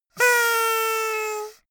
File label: hoot sad